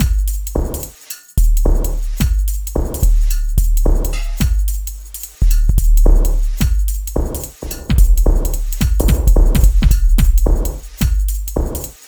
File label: Shuffle Beat